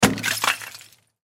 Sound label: Crash Wall Impact Object Break Smash; Crashes; Sound Effects:Objects; Blastwave